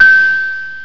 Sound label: DING MID; ALARMS